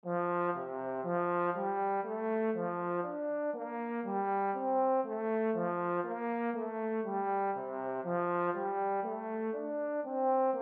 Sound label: Tenor Clef Example